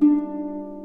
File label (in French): rossignol